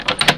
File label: Door Handle Turn